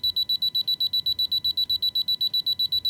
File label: camera Countdown Fast; ZTE Nubia-Z5S-Mini